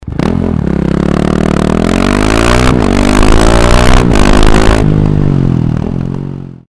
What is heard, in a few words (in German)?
KTM 690 SMC R ohne DBK; REMUS